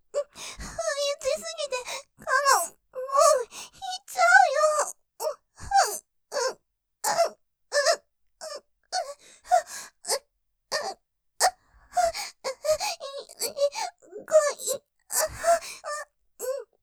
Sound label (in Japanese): 喘ぎ; 不意打ちピストン！イッちゃいそう；；; ループで楽しむ！シェフの気まぐれ抜き抜きコース